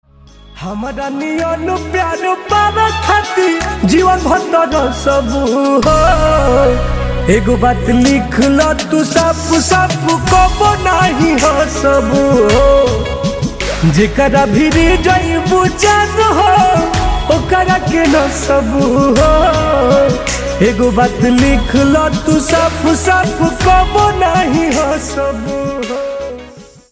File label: Ringtone